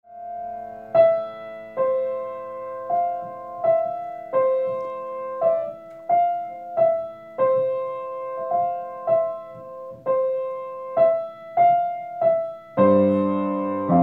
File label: piano